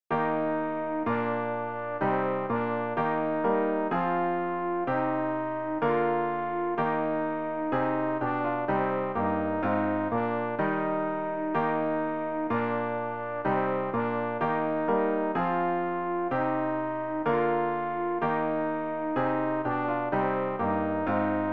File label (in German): alt, Übehilfen für das Erlernen von Liedern; ref gesangbuch; rg 317 schmuecke dich o liebe seele